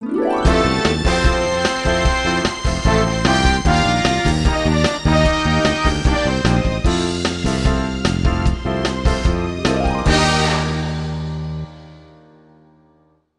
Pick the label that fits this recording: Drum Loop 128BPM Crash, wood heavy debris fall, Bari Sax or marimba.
Bari Sax